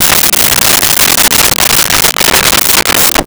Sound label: Object Spins and Falls off Counter, Foley